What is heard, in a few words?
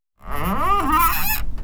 squeaking melodic